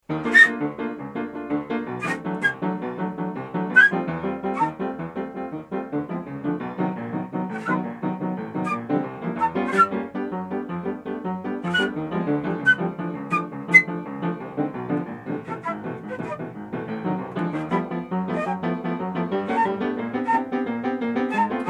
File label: stairs piano